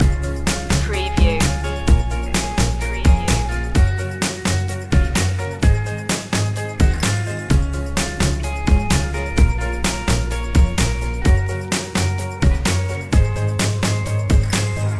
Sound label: hybrid throwback loop